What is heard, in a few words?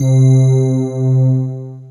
Ring Pad; instrumente